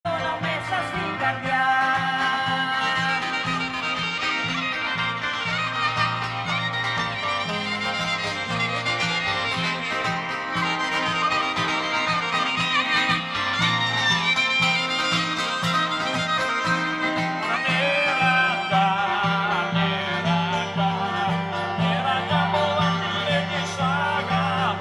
Athens Sunday Park Concert; balkan, folk, greek, music, sing, traditional

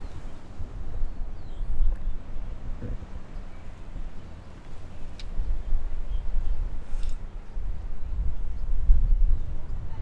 Lovers Point XY

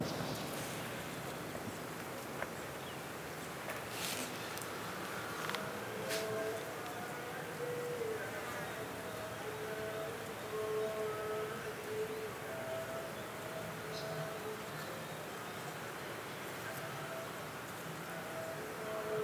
Dalat Forest

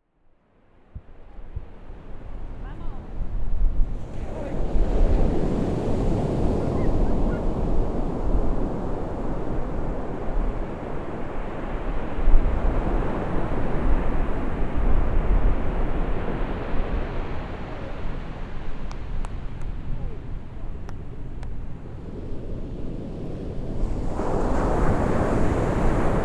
SF Baker Beach Waves; XY

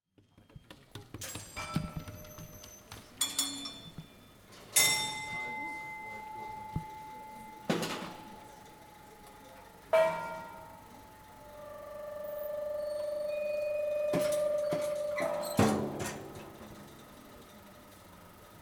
Basel Tinguely Museum

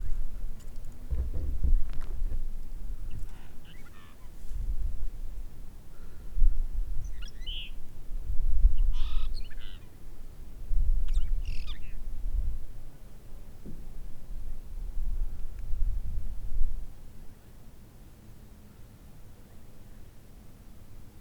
Red Rock Birds; XY

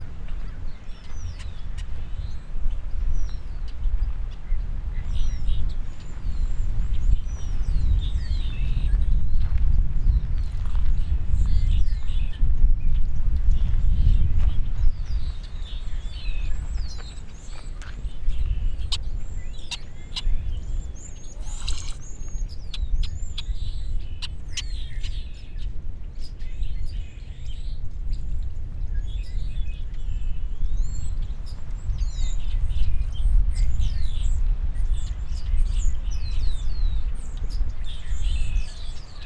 Lovers Point Birds; XY

